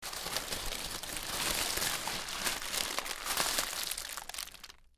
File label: rustle